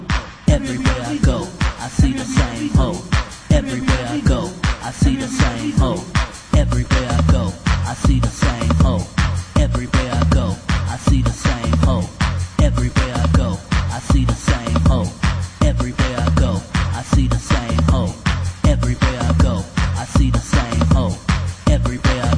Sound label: Disco Guitar; Electrofunk